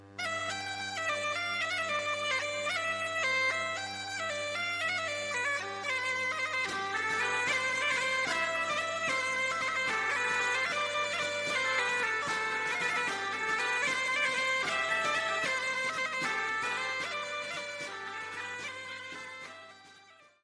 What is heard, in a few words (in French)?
BOURREE - France